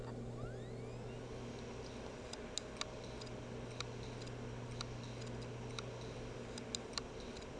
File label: hd sounds, laptop, bad; mac disasters